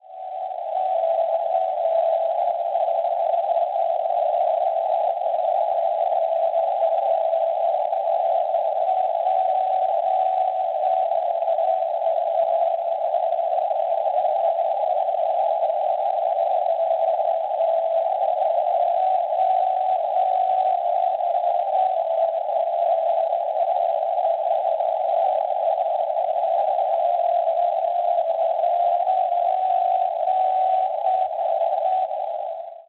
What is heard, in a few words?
NDB Rijeka Croazia 438 kHz; KO; Schwarzsee